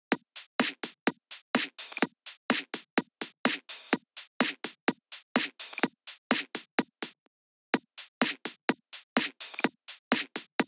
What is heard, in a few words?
Drum Loop, 126 BPM E; Big EDM; Future House Tools; Construction Kits; Snow